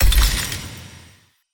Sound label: decision, button